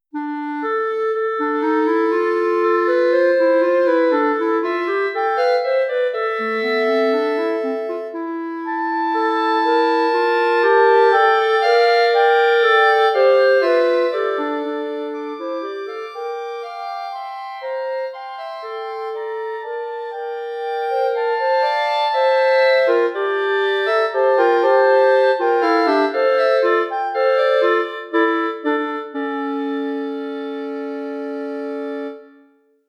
Chorale and Toccata clarinets only; mm. 46-63

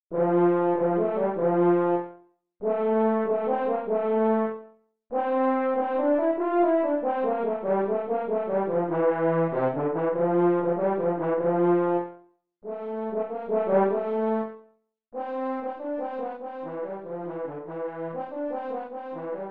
horn transposed; Band; UVM

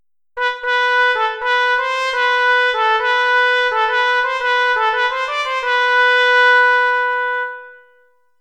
Psalm unison rhythm; mm, 197-200 (MIDI audio file)

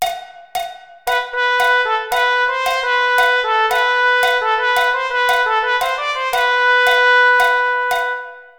unison rhythm with click; Rhythm, mm. 273-276 (MIDI audio file)

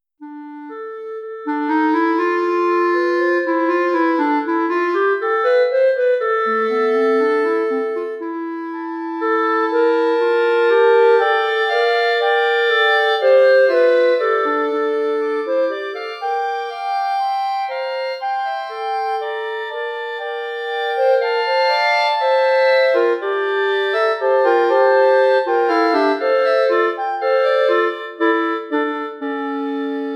Chorale and Toccata clarinets loud; Clarinets, / 2nd / part loud; mm. / 48-63

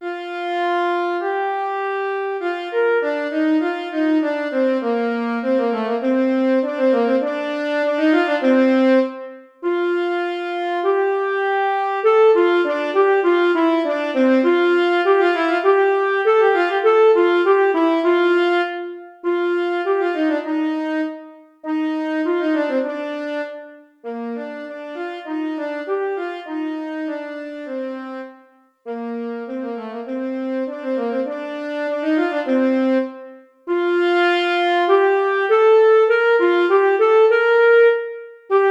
Alto Sax; Band Audition

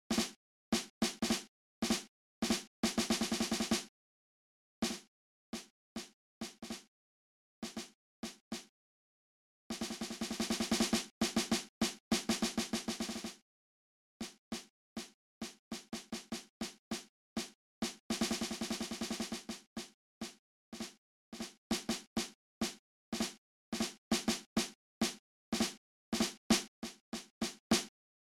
Snare Drum; Band; UVM

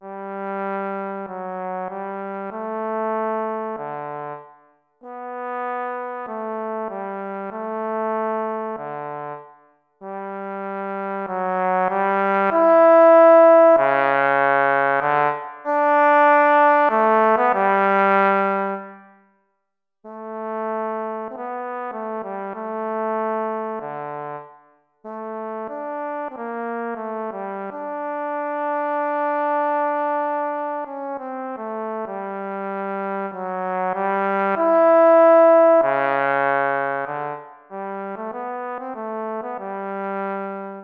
bass clef trombone; Band Audition

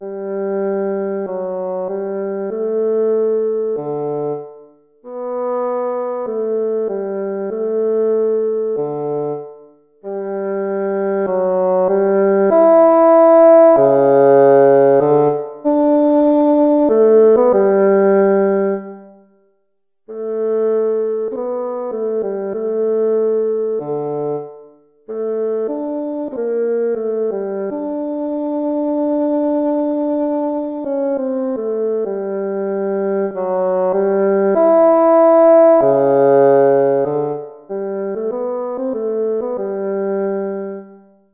bass clef basson; Band Audition

